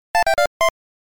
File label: 8bit item get